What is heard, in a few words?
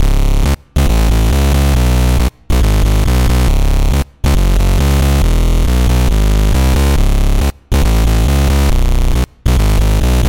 Bass; Routed Sound; Sylenth1 Expansion - Hyper Gamer Vol.1